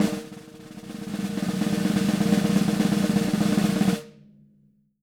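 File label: snare roll; SD ROLLS; Living Drums; Sampleheads; Peter Erskine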